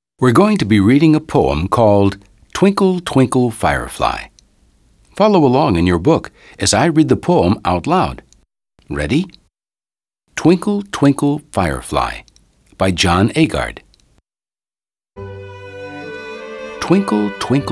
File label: Twinkle Twinkle Firefly